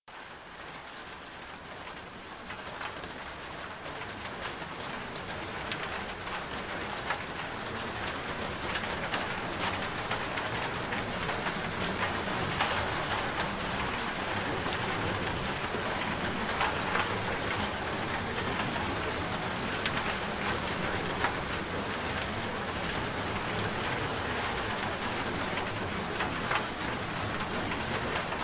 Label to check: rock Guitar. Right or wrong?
Wrong